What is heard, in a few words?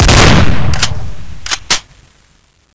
balrog; cstrike